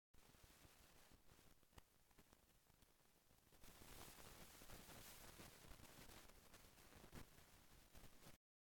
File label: mic noise; m audio pulsar iis noisy pair